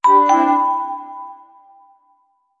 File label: mail